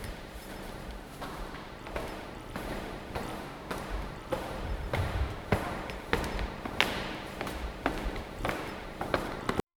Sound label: the priest is wearing, temple